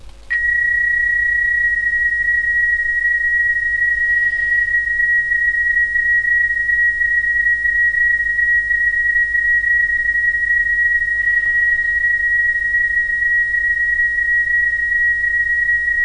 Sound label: psk31